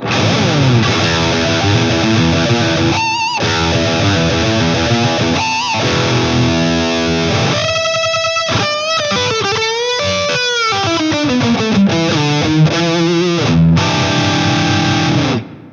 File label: wah tone MIX